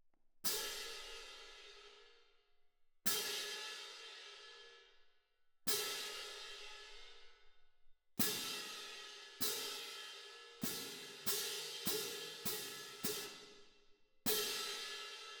Cymb1 Main

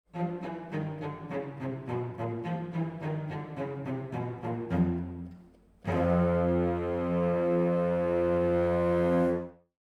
Orchestra, Jazz for piano

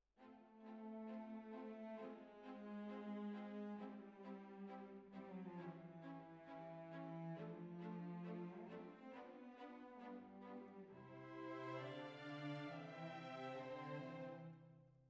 Oboe

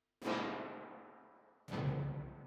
Drums Verb

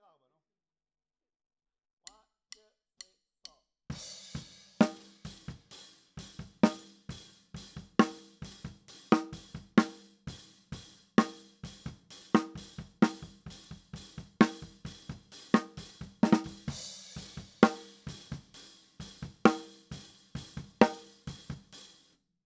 Snare Top

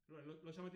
Piano A

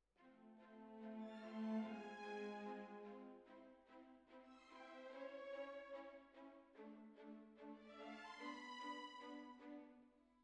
Clarinet

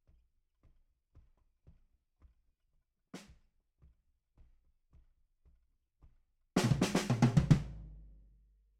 BD Out

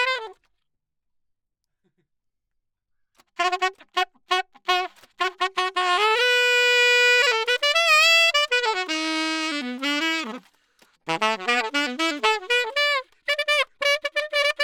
Sax; Gefell

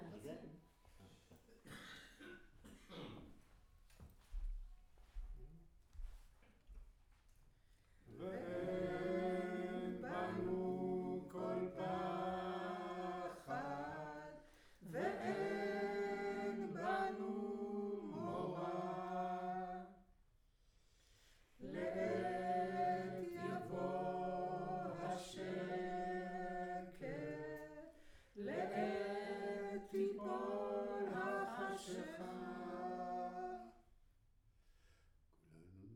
Room, Choir

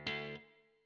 GTR Royer

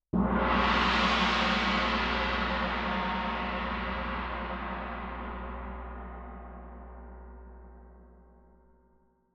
Tam-Tam, Jazz for piano

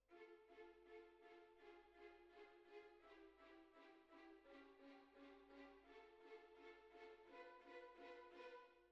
Cbasses

